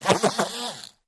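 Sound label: Motor Small Vibrate, variable speed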